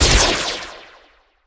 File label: laserring weak